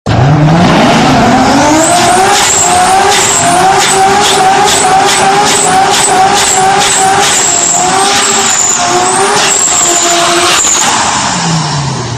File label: Turbo